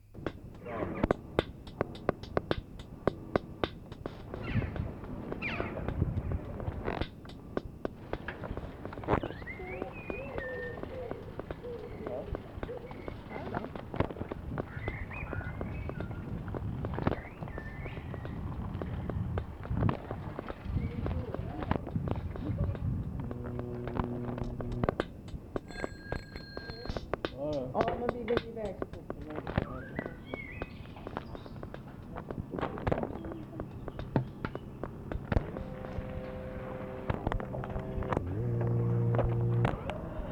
birdz

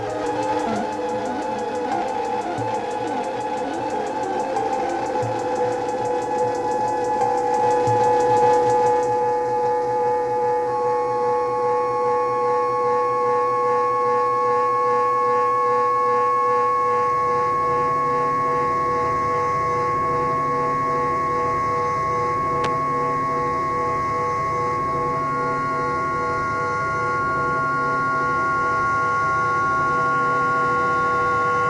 bell reso

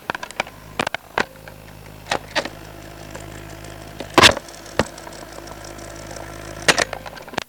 rewind

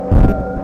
dolphin

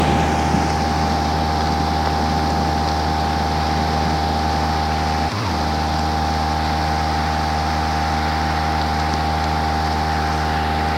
buzz field

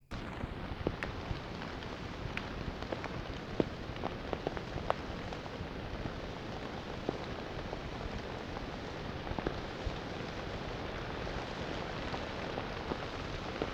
rain

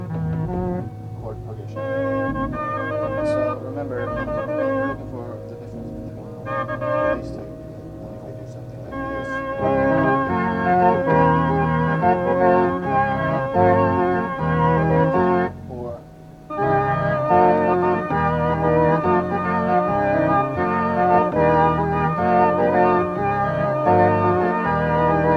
note diff

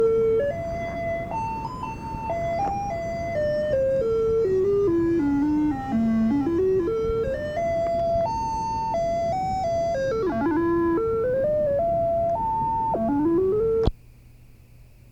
ditty

